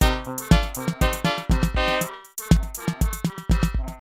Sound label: Cartoon